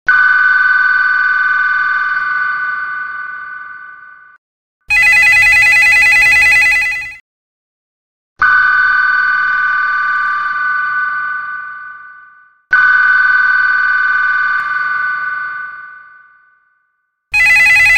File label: simple business ring